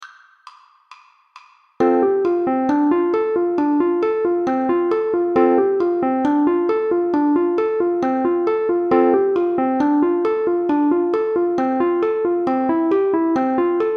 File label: Full Speed 135bpm, uke